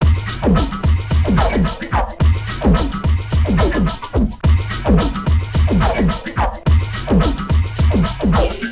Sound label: 108bpm, Sound Loops; FunkYoSampler; CDP Sound Loops